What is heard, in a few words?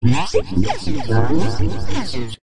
electronic robortic message ringtone